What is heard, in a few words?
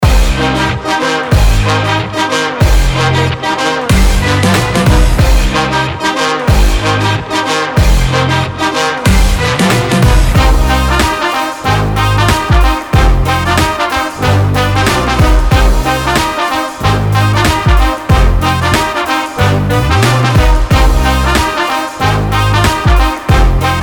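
fanfare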